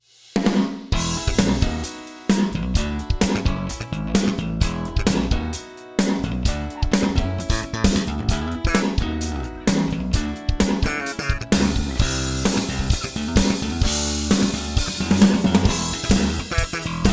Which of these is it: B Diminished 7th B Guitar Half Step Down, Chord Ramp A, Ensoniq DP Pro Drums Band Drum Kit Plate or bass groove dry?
Ensoniq DP Pro Drums Band Drum Kit Plate